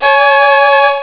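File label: string fifths, instruments; sonix; Rise Of The Dragon; chipmusic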